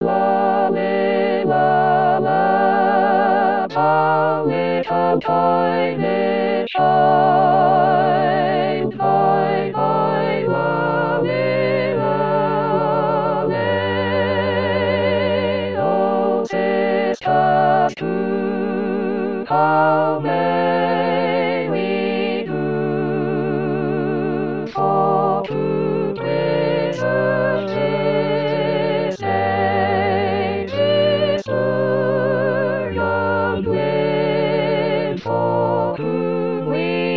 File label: Coventry Carol all